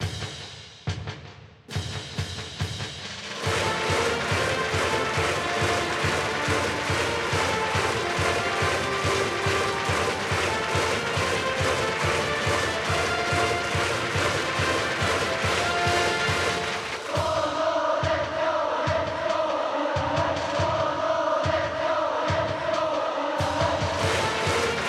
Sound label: Music Ouen Brassband; ebaseball powerful pro yakyuu 2020 ps4 switch gamerip 2020